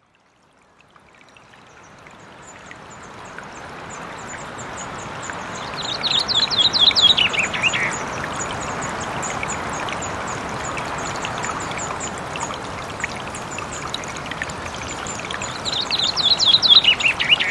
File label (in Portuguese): Floresta Laurissilva Terceira Acores